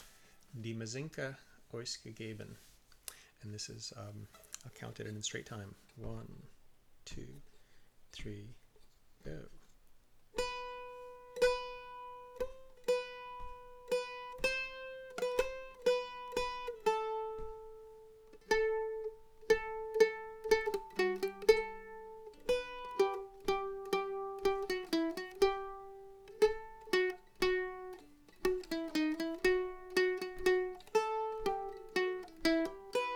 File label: slow mandolin; Mizinke Osgegebn